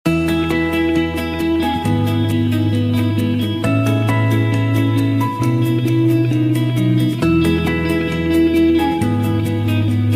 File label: magical morning ringtone